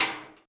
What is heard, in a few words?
RACK STRIK, PERCMETALIC